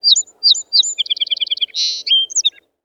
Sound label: Amb Animals; Red Orchestra Ostfront 41-45